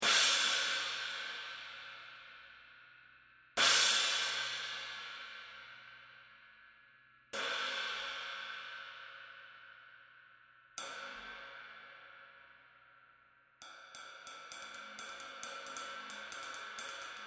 18 Turk Effects crash 18 hole, Bosphorus; cymbal; 1326g

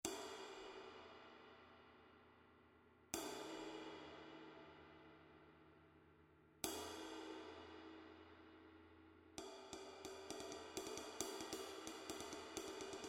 24" Master Vintage Ride, Bosphorus; cymbal; 2556g

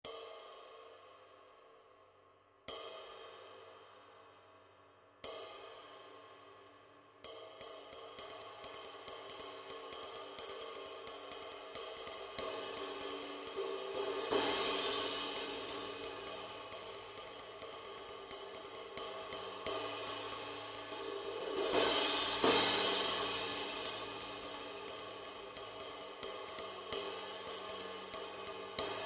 22" 1600 Era Ride, Bosphorus; cymbal; 2300g

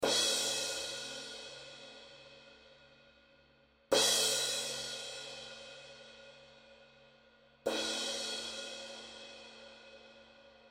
18" Trad Thin Crash, Bosphorus; cymbal; 1258g

